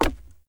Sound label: Unarmed Punch Impact Wood Hollow Large; Weapon Impacts; Player; Environment